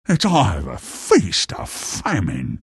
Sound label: death; pudge